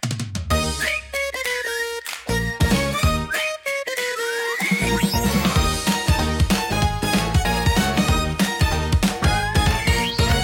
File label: jingle funny; 効果音・ジングル(ショートBGM)